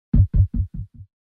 button press; baba is you

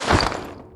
menubar open

